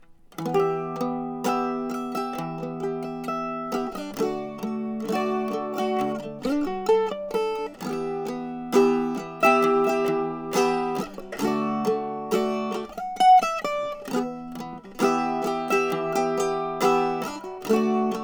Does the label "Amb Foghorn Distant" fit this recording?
No